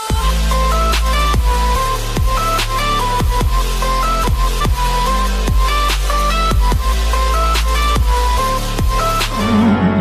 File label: default ringtone